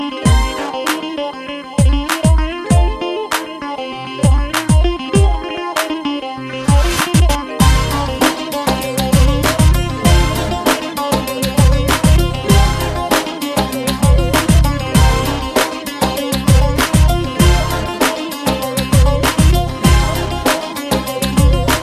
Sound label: new rap music; hip hop